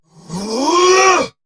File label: Attack Effort; Warframe; Dax; Dax Ashigaru; Dialog Barks Battle